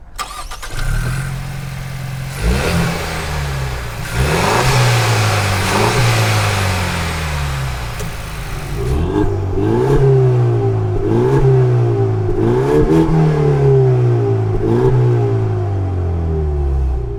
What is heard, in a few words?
ford mustang